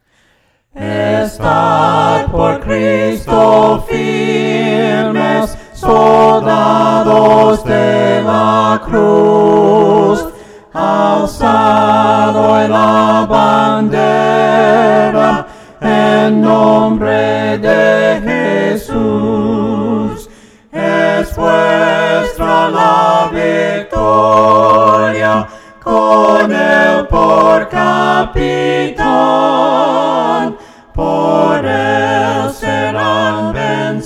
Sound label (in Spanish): VOCES; ESTAD POR CRISTO FIRMES